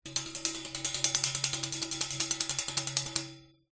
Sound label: foley metal cage small metal object run over bars rattle